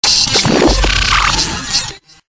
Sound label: Glitch Sequence